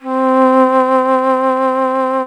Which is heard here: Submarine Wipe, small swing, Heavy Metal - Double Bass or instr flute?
instr flute